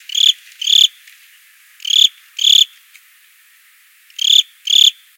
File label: critter; matmos hl; wildlife